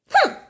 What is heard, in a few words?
hrmm; sm64coopdx; char-select-extra-chars; daisy